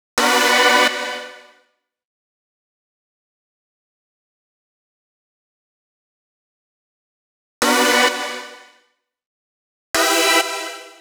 129 Em Synths, Complex Electro Synths; Prime Loops; Arena

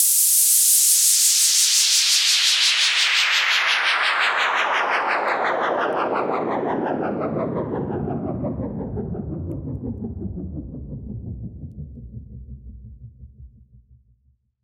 FX Downlifter

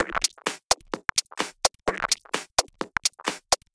Loop, 128 BPM

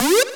Synth Shot C

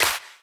Claps & Snares

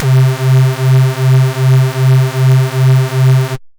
Dirty Dutch Lead C3; Vengeance Electroshock Vol.2; VES2 Dirty Dutch Special; VES2 Dirty Dutch Leads

